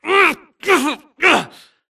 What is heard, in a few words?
grunt Struggle; Titanfall 2